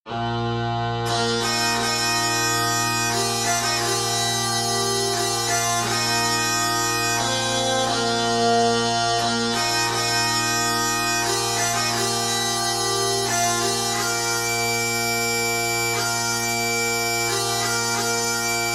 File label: Reverb